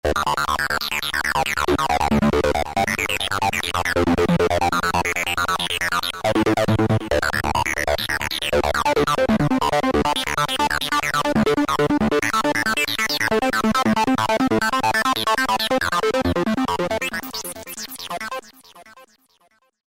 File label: Korg microKORG S&H Signal; Factory Voices